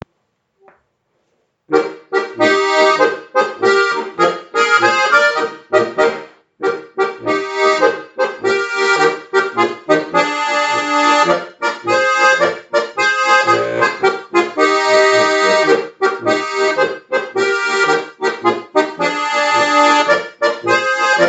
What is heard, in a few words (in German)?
Sternpolka